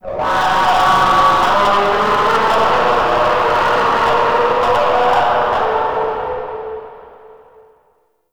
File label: Resonating Didgeridoo; Warframe; Shadowgrapher